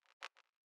zap

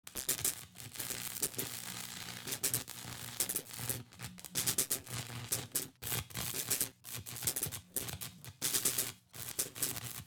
environment zone electricity

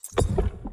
environment room activation

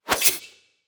shadow assassin strike; Shelter

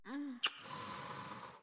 npc strike; Shelter

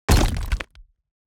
environment room damage stone

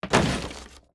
environment zone barricade break

